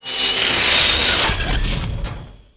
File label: destr droid unfold, droids robots